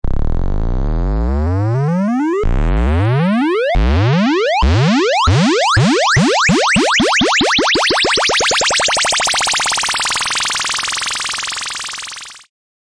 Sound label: videogame wobble up; Electro and Synthetic